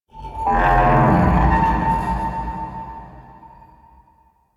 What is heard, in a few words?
Tech Texture Large, Ambience; Warframe; Cephalon Weave; DE